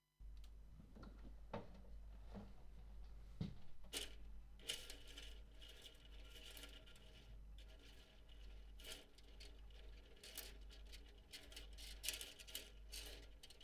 tape; kuehlgeraet hinten; Fieldrecordings

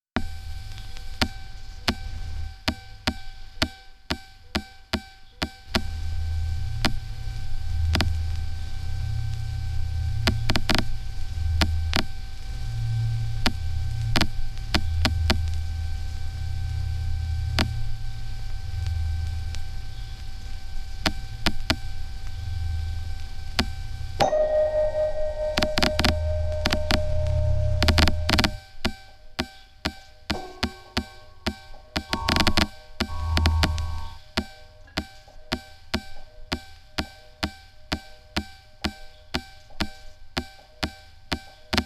Rua da Alfandega, Lisbon; Fieldrecordings

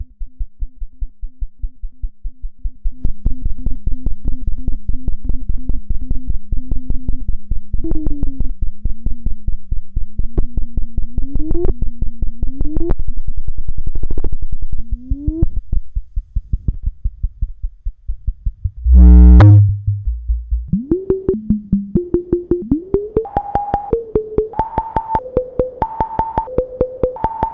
Pro One, Improvisation

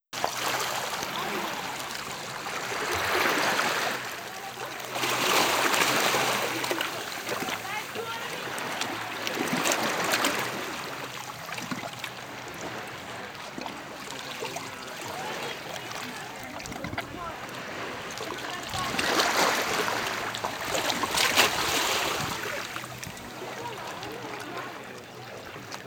Farangas Beach; Greece; Original Video Ton; Fieldrecordings

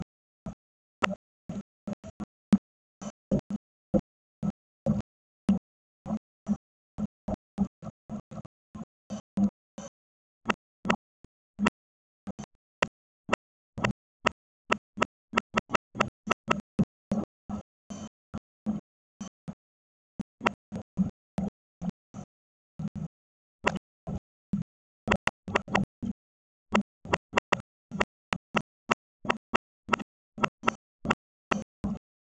UW soundtrack; pitch; Fieldrecordings; Sipadan Video Soundwork

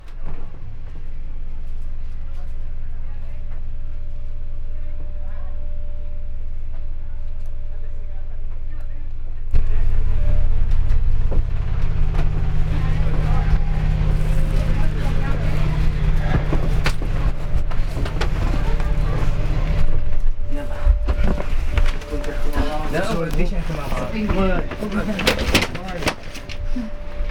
London, Tube; Train Stanstead

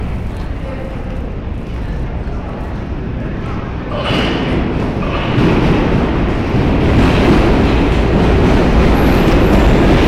London The Tube; 02 London; Ale Home

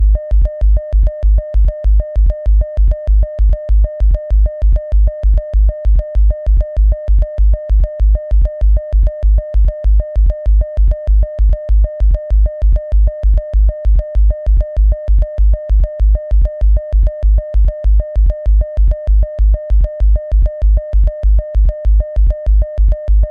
techno

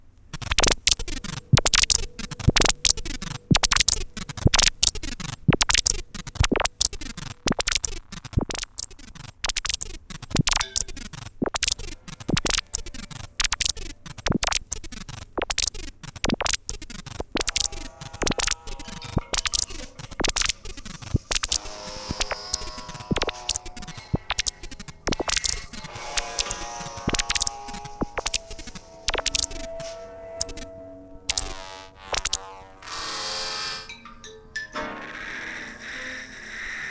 Rua da Alfandega, Lisbon; Fieldrecordings

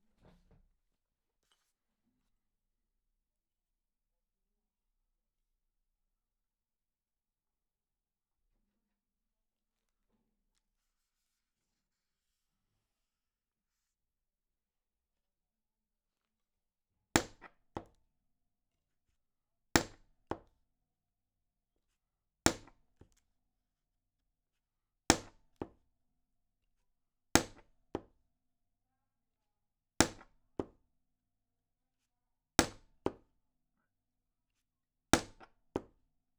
Ambient Stereo

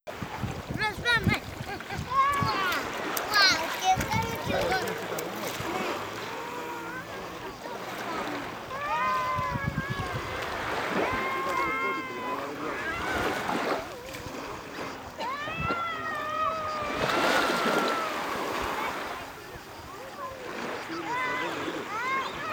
Krios Beach; Greece; Original Video Ton; Fieldrecordings

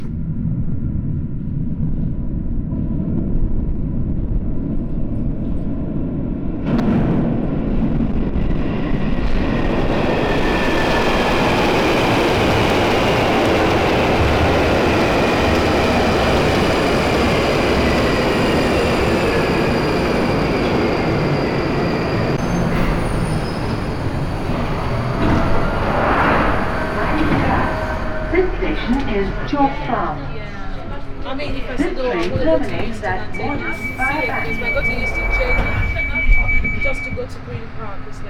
London The Tube

